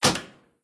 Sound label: close